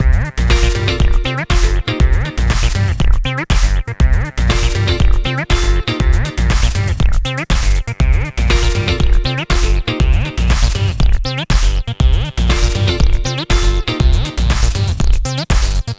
Candy Bass with drums

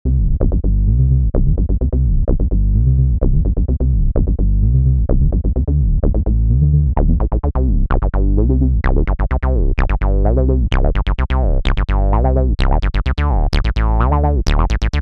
Disco Party Bass

